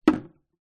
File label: household soap dispenser plastic down on sink